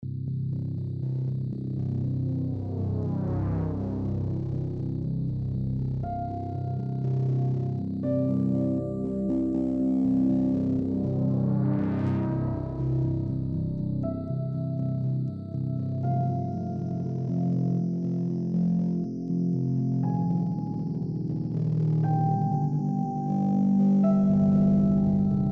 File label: groove